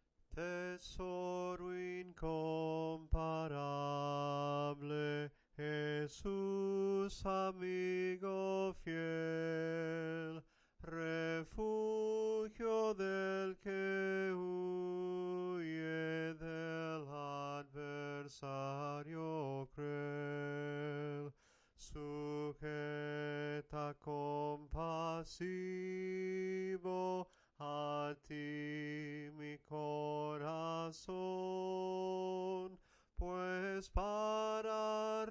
himnos en melodia soprano; TESORO INCOMPARABLE; cantos del camino

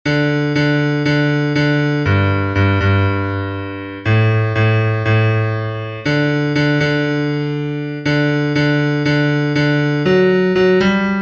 BAJO, himnos en piano; cantos del camino

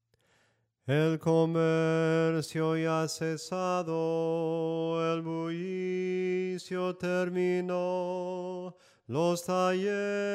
SOPRANO CANTADO; EL REY YA VIENE

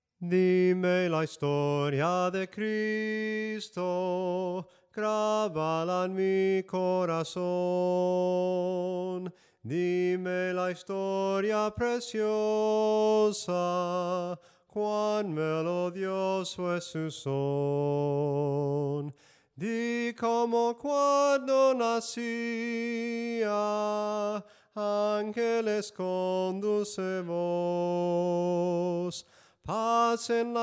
SOPRANO CANTADO

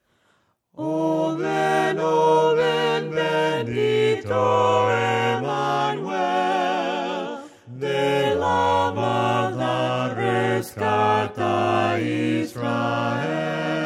VOCES, himnos a capella; cantos del camino; OH VEN BENDITO EMANUEL

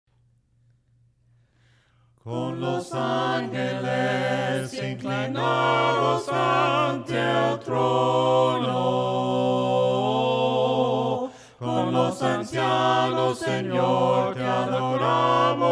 VOCES, himnos a capella; cantos del camino; CON LOS ANGELES

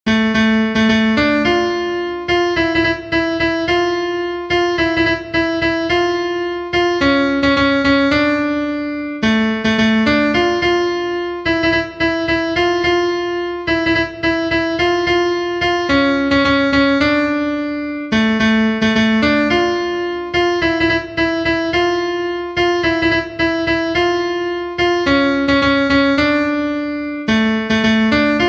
CONTRALTO, himnos en piano; 5 TE ALABARE SENOR; cantos del camino

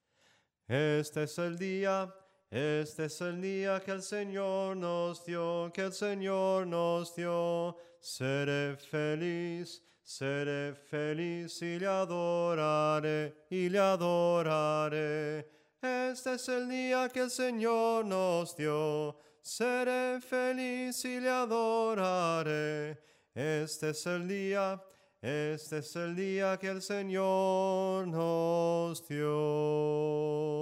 SOPRANO CANTADO; cantos del camino

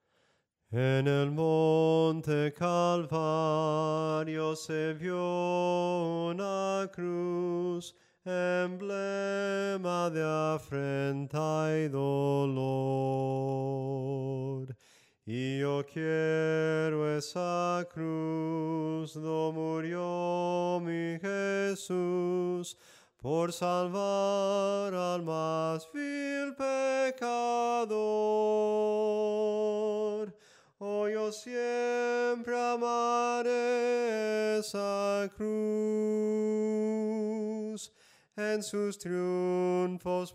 SOPRANO CANTADO, himnos en melodia soprano

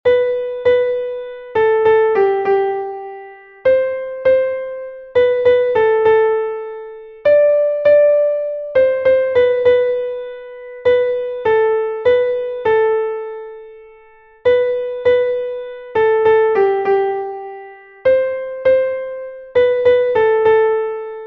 SOPRANO, himnos en piano; 78 MARAVILLOSO ES EL; cantos del camino

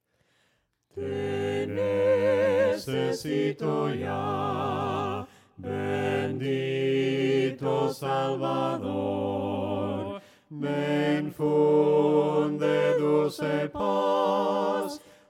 TE NECESITO YA VOCES, himnos a capella; cantos del camino

